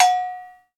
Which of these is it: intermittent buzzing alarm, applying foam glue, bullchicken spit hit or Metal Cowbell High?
Metal Cowbell High